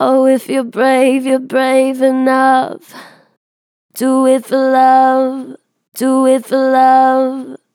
Vocals, Natural Vibrato Strong, Oh if your brave; Harmor Vocals

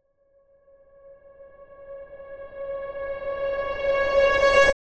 Riser

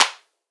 Riddim Clap

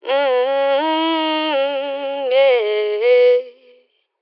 Ad Lib Dry, C# Min, Vocals; Cymatics - Eternity Sample Pack; Ad Libs

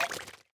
Foley (Watering)

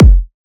Kick, Techno, One-Shots, Drums

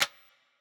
Hihat, Dubstep

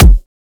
Kick, Dubstep; Dark Matter

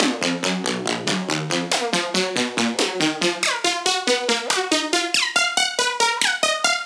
Riddim Melodic Loop - D# 140; Cruncher; Ghosthack; Riddim Essentials Volume 2

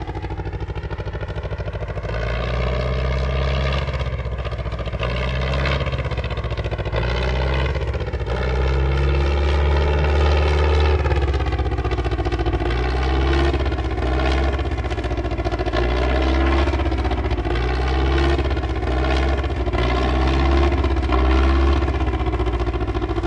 Prop Plane Overhead; Vehicles; Aircraft

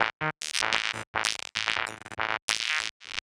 Grid Loop A 145 BPM, Psytrance; Grid Loops; Narval

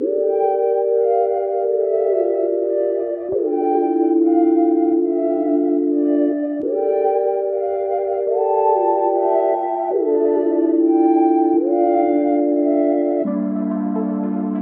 145 BPM D Min, Melodies; Cymatics; 2022 Melody Mystery Expansion

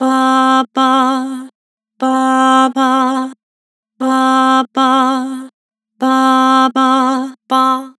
Vibrato 116 Bah, Vocals; Harmor Vocals

